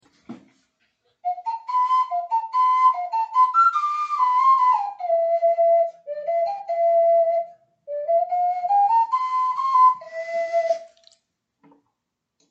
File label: ocarina